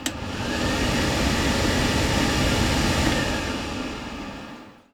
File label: Bathroom Hand Dryer, Pub Foley; Glitchedtones